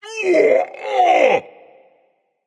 ouch, voice; Smite; ymir skin14

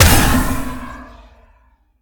impact; smite; olorun

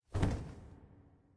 flap; smite; kukulkan

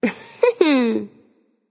"heh heh"; smite; voicepack; nike

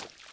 recall footstep; smite; poseidon

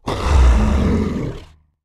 intro growl; Smite; Sobek

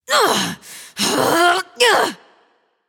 grumble; daji

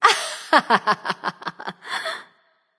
heh heh; smite; aphrodite

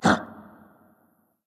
hitreact human male small

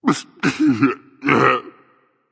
boohoo berserk; cuchulainn

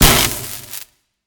passive hit; smite; raijin

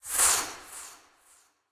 soul; Smite; Baron Samedi

